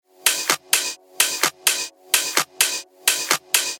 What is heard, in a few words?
128 BPM; Seduction